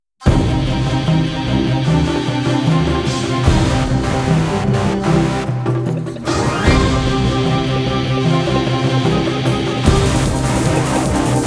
Fast High Action; Iron Soul

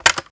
Button

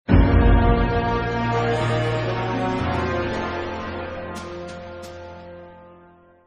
Fight Lose; Dungeon Rush; android

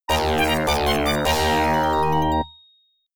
Logo (Arcade Version); Visco

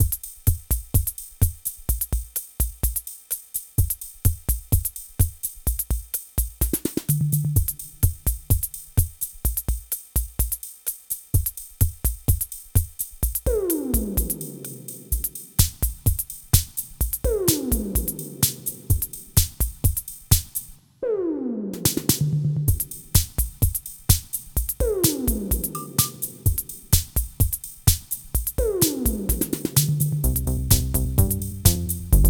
Beat; Peggle Beat 05

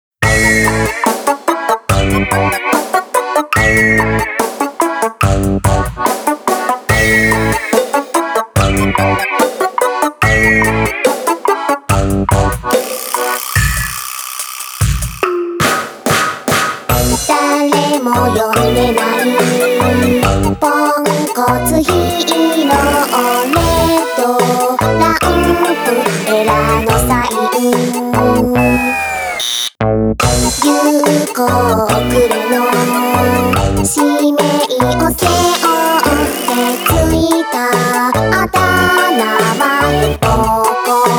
Theme, Junk Mech Version; Zettai Hero Project; Absolute Victory Unlosing Ranger

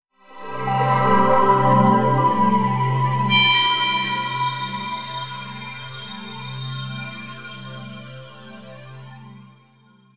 Heart Container Appears; the legend of zelda skyward sword expanded

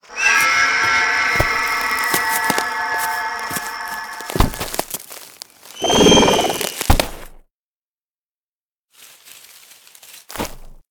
Queen Death

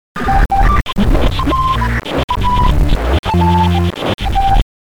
Glitch; songs in the key of meat

